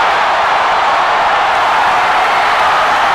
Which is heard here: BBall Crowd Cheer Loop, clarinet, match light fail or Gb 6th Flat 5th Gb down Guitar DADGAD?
BBall Crowd Cheer Loop